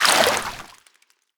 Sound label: water exit; player